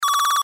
Toy Laser Gun Sci-fi, Toy Laser Gun Science Fiction Blaster Alarm; Foley; Blastwave